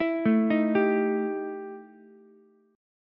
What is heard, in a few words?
E Minor Major 7th Double Flat 5th E Ukulele; arpeggiated; JGuitar